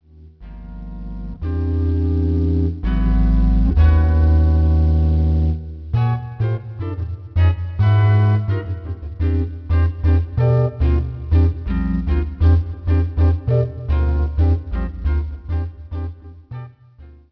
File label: gtr bs